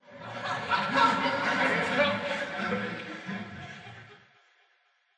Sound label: Voices Ambient